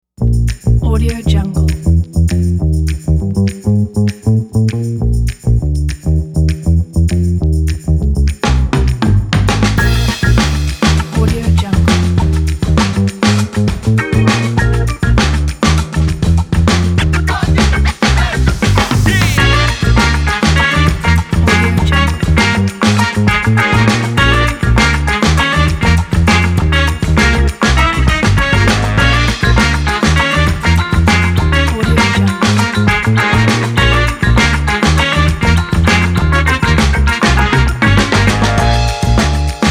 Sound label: vintage funk